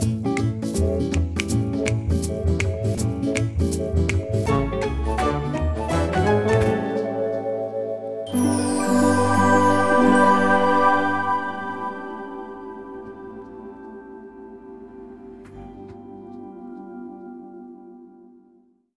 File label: Minigame Peng Win